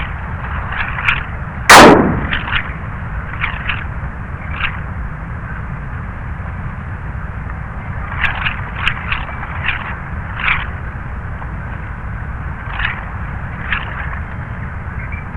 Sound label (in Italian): Colfiorito, Sonificazioni Eventi Sismici; Colfiorito Magnitudo ML 3.6 Prof. 70km 26/05/2013